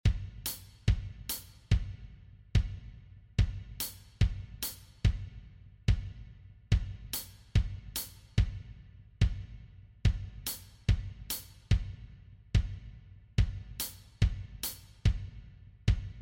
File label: Drunken sailor Set de batterie